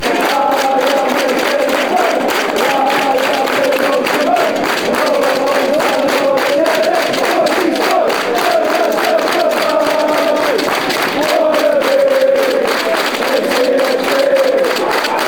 i am a fan fan chants; shaymen

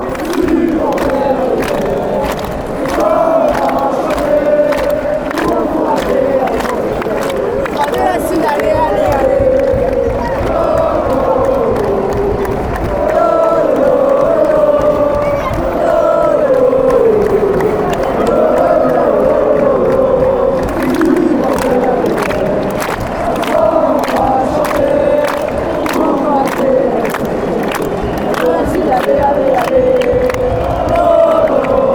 supporters sing; lolo

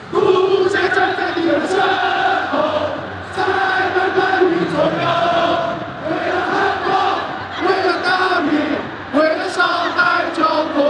Shanghai Greenland Shenhua football song, 沪语版; yi bao ge; shanghai shenhua fc

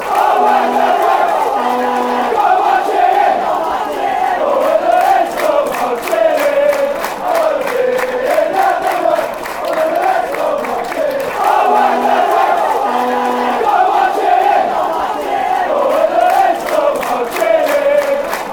oh when reds go marching, fanchants

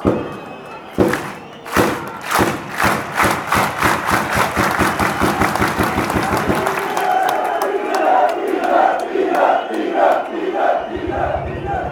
claps; luzern

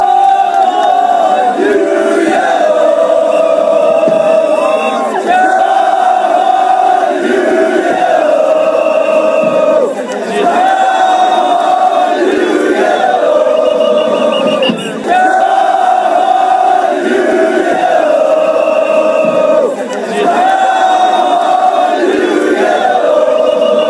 come on you yellow, chants

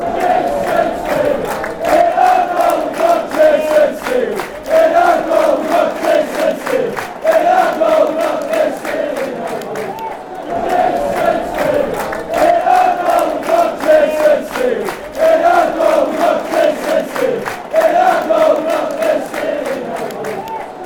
weve got, fan chants; jason steele

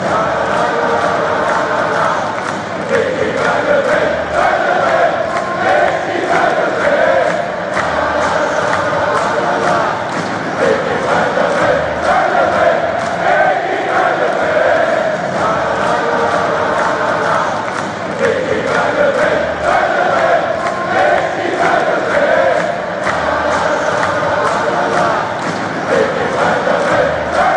player chant, fan chants; micky van de ven; tottenham

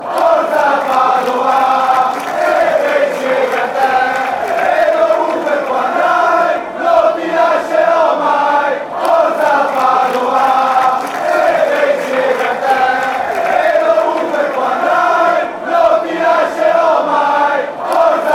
with you, fan chants

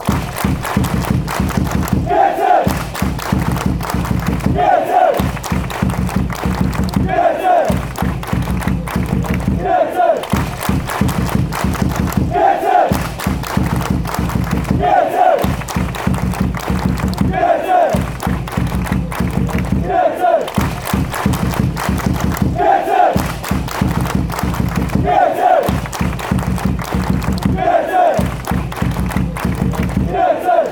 claps drums; gc

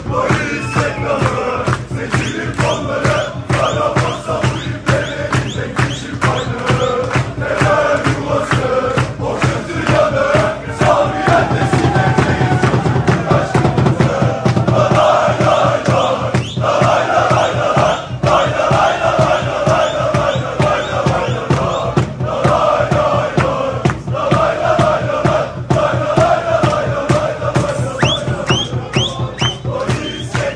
fan chants; dogdugun gunden beri

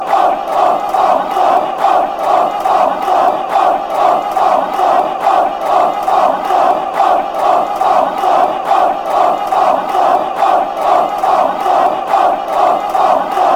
off off off, chants

